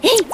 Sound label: click